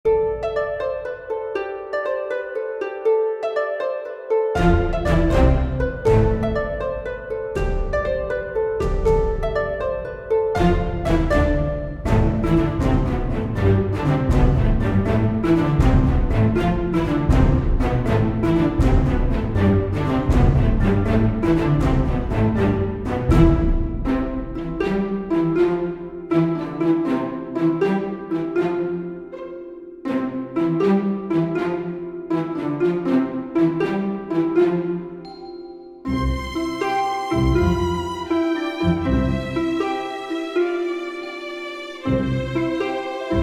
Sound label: loop; ivan duch music packs